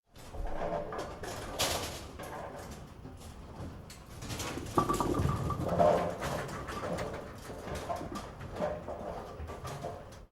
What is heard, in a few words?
bowling pin clear